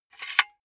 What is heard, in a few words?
silicone gun empty pusher pull out fast; berufe; handwerker; soundpool